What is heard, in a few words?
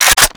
ak47 bolt pull